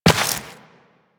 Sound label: Step Rock, Footsteps, Walk; Warframe; Tenno